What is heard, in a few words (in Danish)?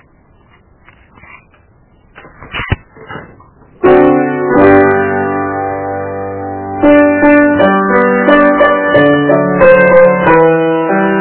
tenor stemmen; Det er paaske